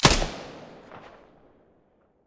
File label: silencer p90 stereo, weapons